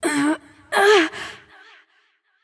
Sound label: grunt lift; bellona